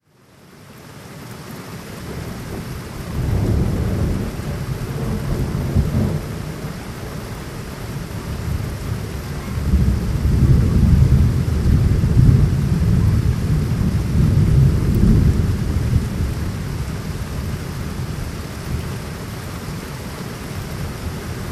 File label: heavy rain distant thunder; Inside Out House is a binaural audio installation in the form of a wooden outhouse